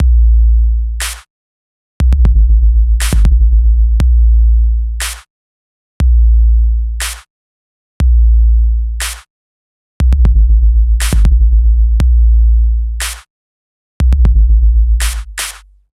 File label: DARK BELLS BASS SNARE